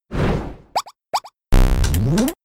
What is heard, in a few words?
Taunt; attack moves; Pokemon; Gen 5; Blk Wht Blk2 Wht2